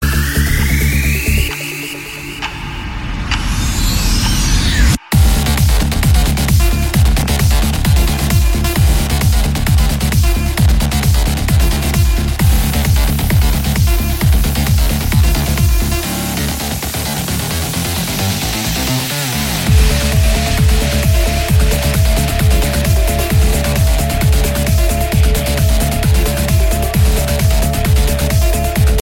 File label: trance ringtone